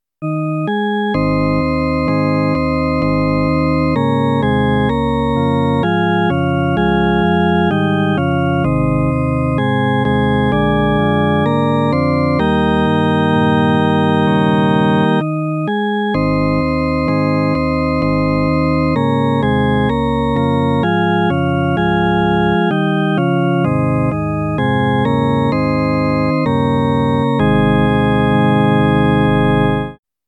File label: Building Day by Day, hammond